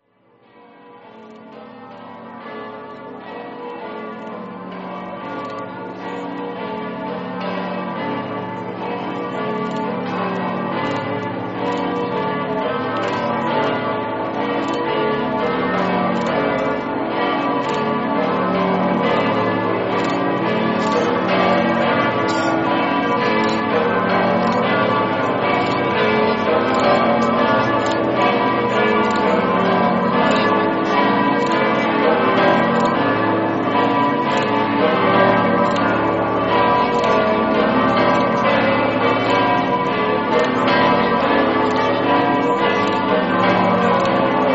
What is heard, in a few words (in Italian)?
udine, campane